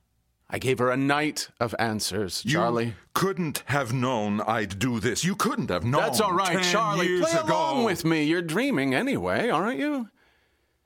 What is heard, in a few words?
Clap Hands